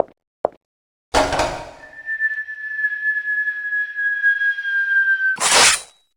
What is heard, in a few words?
fall hole